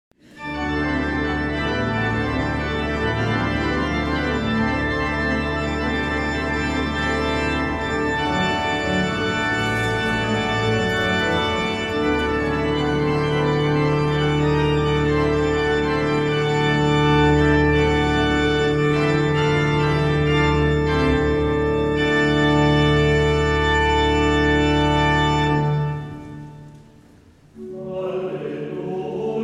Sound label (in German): Halleluja ruf; 300 Jahre St. Dionys